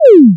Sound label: falling; Ulmos Adventure; pygame